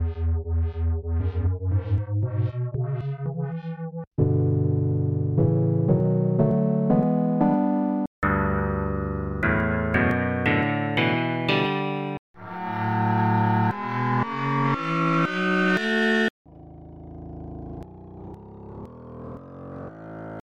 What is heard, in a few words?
E base Pentatonic